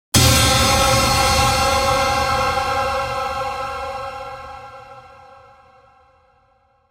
Metallic Stab, Crashing metallic stab in the key of C.; Stabs (Sound Effects:Sound Design)